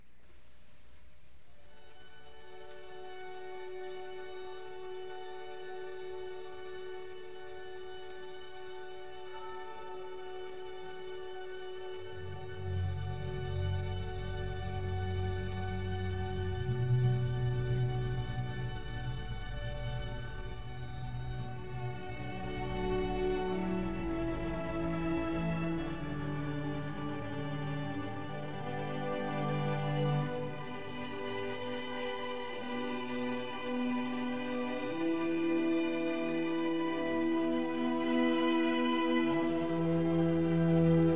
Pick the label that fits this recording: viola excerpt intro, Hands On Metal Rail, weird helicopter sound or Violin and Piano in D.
viola excerpt intro